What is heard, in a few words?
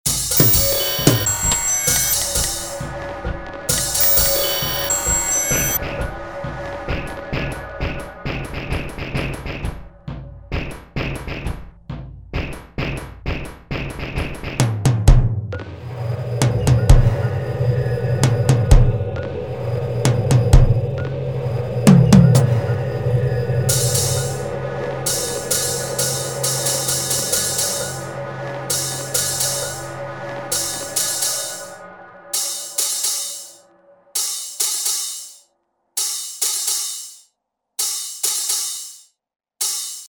drum team; uglavarian